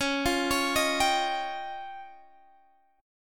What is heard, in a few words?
F 7th Suspended 2nd Sharp 5th